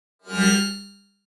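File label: Pop Up Electric Buzz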